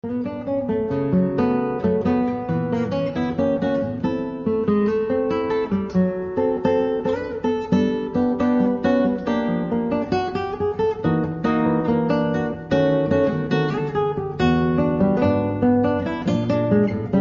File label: wedding jazz guitar